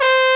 BRASS C4, pop brass; AKAI S6000 CD-ROM - Volume 1; BrassWind

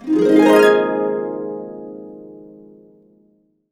Complete Harps; USB Soundscan vol.62; AKAI

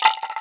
RATTLE, INDIAN PERC; Didgeridoo and Other Primitive Instruments

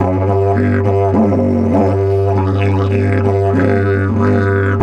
G 100, DIDGE 100BPM, Didgeridoo; Big Fish Audio - Didgeridoo and Other Primitive Instruments

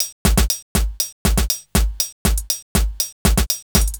120 Sad Story Hats; Zero-G; Soundclash Nu Dance Electro; Acidized Wavs; Combi Loops; 120bpm

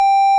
Beep; Bitkits; Blip & Blop

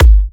G, kicks; markus hakala kicks

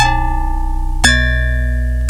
PPG BASS; Rubber Chicken Software Sample Bank

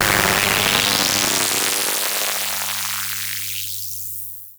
Yamaha CS5, Filtered Noise

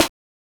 Rimshot; Genesis

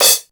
CYMB; AMG Gota Yashiki Groove Activator; GOTA CYMBALS

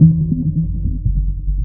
PERC RITUAL; Big Beat Samples & More; AKAI

